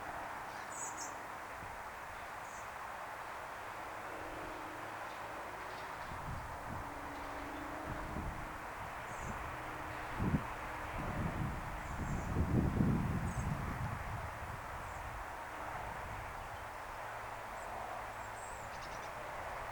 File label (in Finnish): rakattirastaan poikanen; kesan toisesta pesueesta ilm